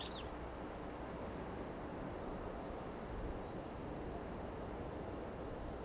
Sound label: Woods